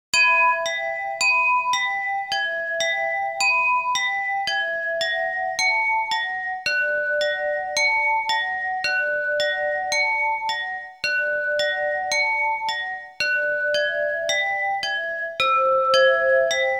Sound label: versophone